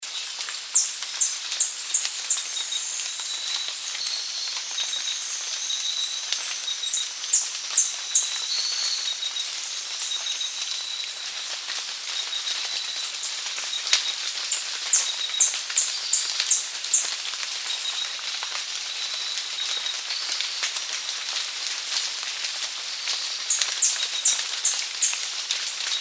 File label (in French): ariane de rosenberg, chant